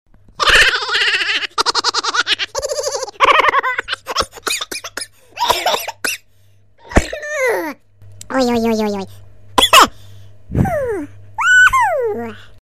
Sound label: sound baby laughter